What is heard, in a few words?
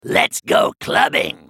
attack; Trundle